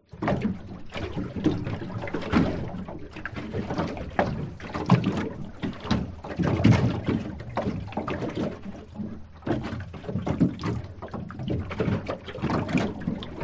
transport motorboat floating sea choppy waves recorded close to floor hull